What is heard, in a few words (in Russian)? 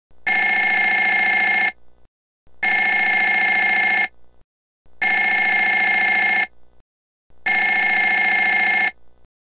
telefon 4 odinochnih zvonka